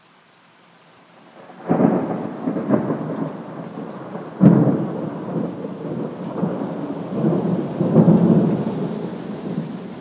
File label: stormy sunday afternoon